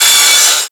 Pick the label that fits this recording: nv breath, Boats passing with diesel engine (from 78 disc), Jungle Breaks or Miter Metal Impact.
Miter Metal Impact